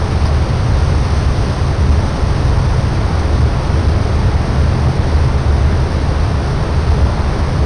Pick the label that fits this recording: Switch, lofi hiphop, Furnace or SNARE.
Furnace